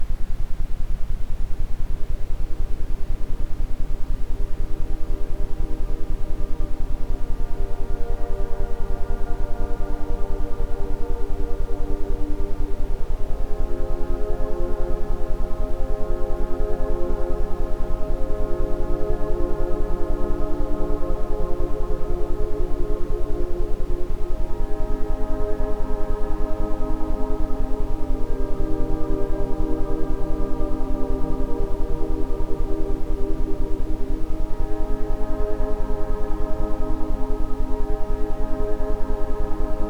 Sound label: isochronic, Ambient Sound; Entspannung Stressweg; isochrone toene